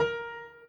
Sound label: piano intro